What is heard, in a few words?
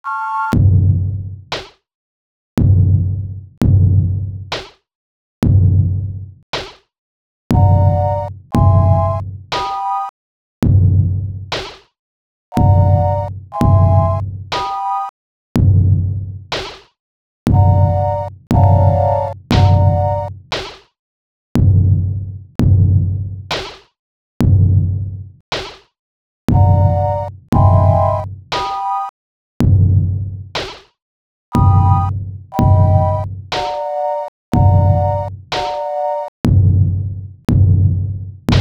sine